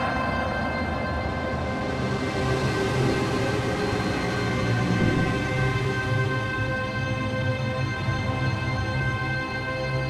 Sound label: Danger Tonal; Insanely Twisted Shadow Planet